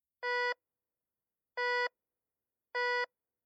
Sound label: Metal Detector Search Beeps Slow Electronic; Miscellaneous Electronic Sound Effects